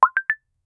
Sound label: cute dating app notificat; app sounds